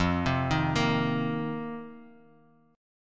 F 7th Suspended 4th F down; arpeggiated; JGuitar

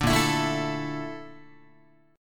A sharp Major 7th Flat 5th A sharp Guitar; A#M7b5; strummed; JGuitar

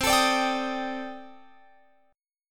C Minor 6th Add 9th, C, down, Guitar, Open F; strummed; Cm6add9

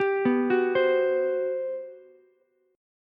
G Major 11th, Ukulele C6; arpeggiated; JGuitar

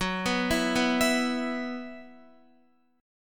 F sharp 7th Suspended 4th, down, Guitar; arpeggiated; F#7sus4; 4E,4A,5D,5G,5C,6F

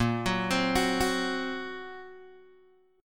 A sharp Suspended 2nd Sharp 5th, Guitar DADGAD

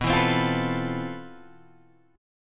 C Major 13th B

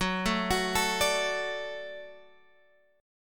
F sharp Minor Sharp 5th, Guitar Open G; chordshape; down

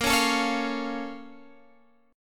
A sharp Minor Major 7th, down, Guitar, Standard; A#mM7 strummed; JGuitar

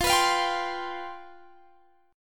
C Major 7th Suspended 4th F

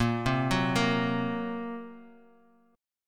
A sharp Suspended 2nd Sharp 5th, Guitar Standard; arpeggiated; 6,3,4,3,x,x; JGuitar

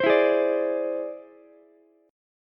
E Augmented 7th E down Ukulele; E+7 strummed; JGuitar

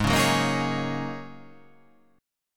G sharp 7th Suspended 2nd Suspended 4th G sharp

